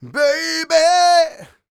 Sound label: SING, Vocal Planet R&B & Dance; ILIO